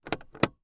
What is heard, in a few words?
Transportation Chevy Trailblazer Turn On Light Interior